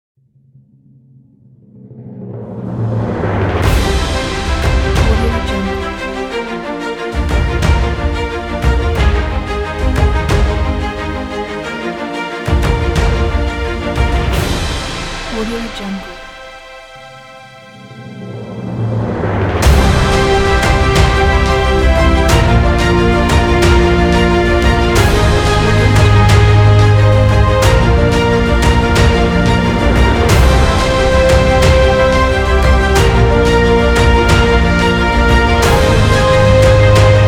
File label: awarding kit